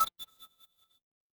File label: retro game coin